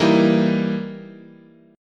E Minor Major 7th Flat 5th, chord

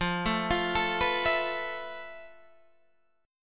F Major Sharp 11th Guitar Half Step Down

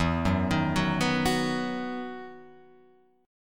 E Major 7th Suspended 2nd, Guitar, Open F; EM7sus2 arpeggiated

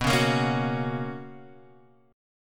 B Major 7th Suspended 2nd Guitar Open G, chord

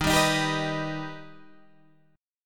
Eb Augmented Eb, Guitar Open G

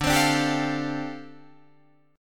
D Minor 11th D down Guitar DADGAD

